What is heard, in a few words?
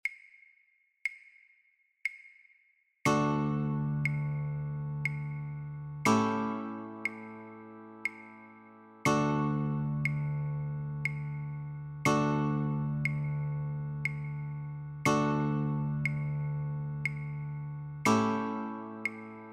Chords, guitar; Pop! Goes the Weasel